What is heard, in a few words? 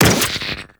Proj Generic Whoosh, weapons; Lizzie; Warframe